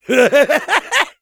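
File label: laugh happy, French; Soldier; "[Rire joyeux 2]"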